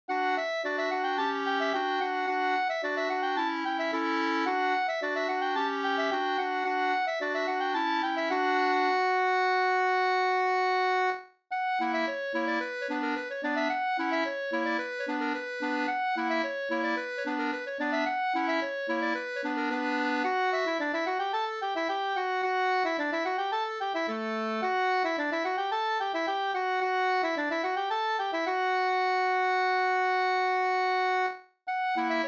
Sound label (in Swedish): halling; Hallingen fr Bh sfvod2 2st